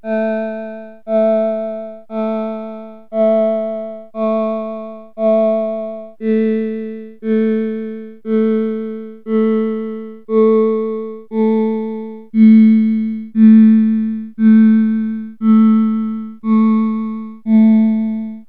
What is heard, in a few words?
voice